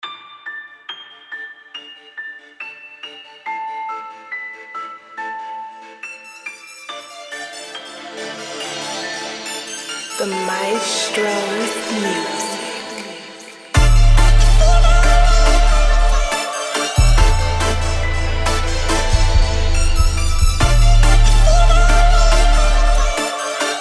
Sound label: Beat